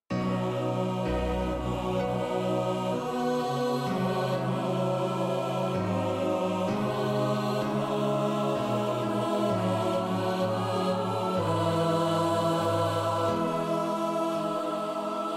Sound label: choir; trees; sibelius sounds essentials